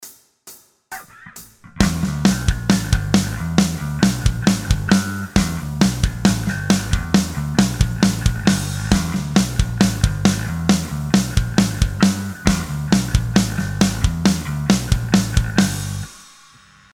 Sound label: Backing Track; rock guitar mastery